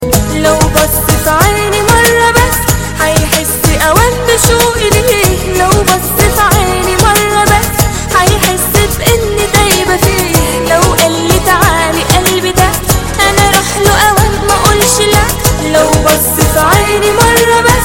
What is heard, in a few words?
arabic song